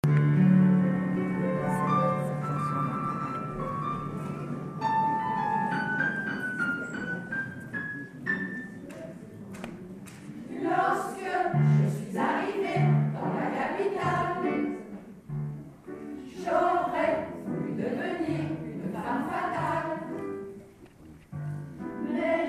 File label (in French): ensemble vocal; meli melodies